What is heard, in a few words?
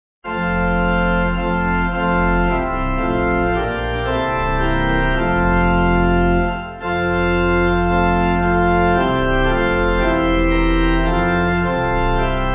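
Pipe Organ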